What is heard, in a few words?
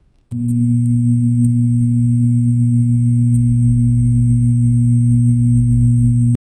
magic barrier sound low